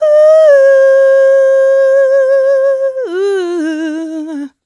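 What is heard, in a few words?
"uh" female, 127, e-flat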